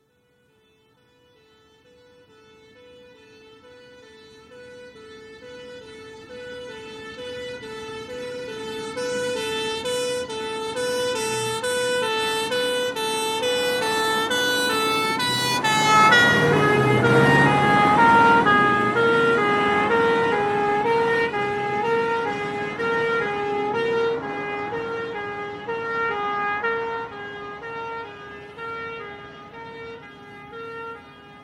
Fire engine, pass right to left with revving engine and two-tone horns; Emergency Vehicle Sound Effects; BBC